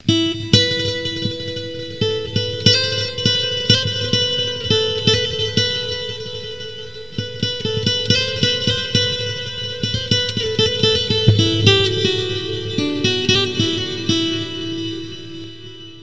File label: my guitar